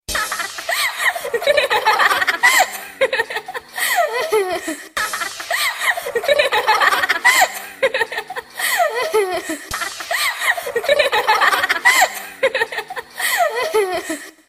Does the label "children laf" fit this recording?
Yes